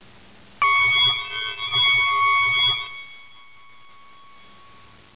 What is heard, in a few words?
screech; Broderbund Print Shop Multimedia Organizer Deluxe Edition